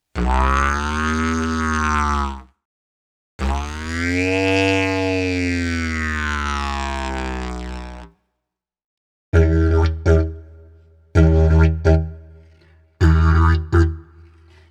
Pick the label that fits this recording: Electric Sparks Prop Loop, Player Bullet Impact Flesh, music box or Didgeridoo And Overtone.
Didgeridoo And Overtone